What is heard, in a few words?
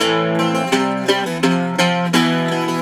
Celtic Flavours; USB Soundscan vol.42; AKAI